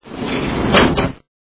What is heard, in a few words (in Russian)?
zadvinuli yashik stola